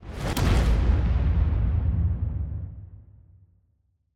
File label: hydrogen hit; OpenFrontIO